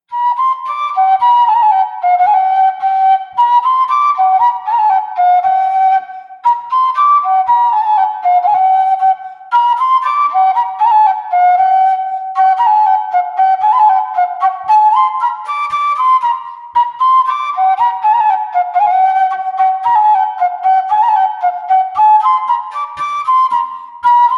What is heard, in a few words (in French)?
Bourrée des Montagnards; Avio un capello di paillo; Petit Jacques et georgette